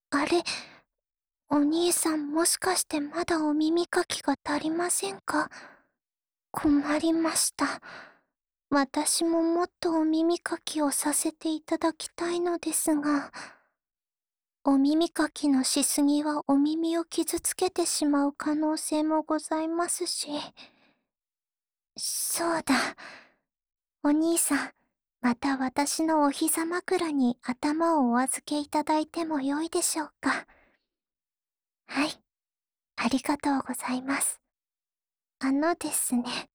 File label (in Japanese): 両耳の指かきのループトラック(右), ASMR